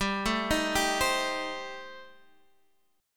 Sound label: C Minor 6th G, chord